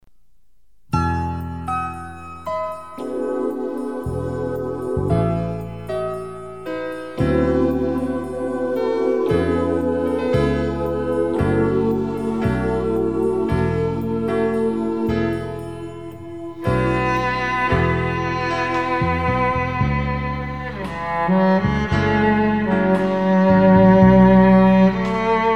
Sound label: Cello